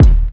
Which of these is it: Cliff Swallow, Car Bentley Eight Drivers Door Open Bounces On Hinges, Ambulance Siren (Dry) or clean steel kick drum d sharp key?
clean steel kick drum d sharp key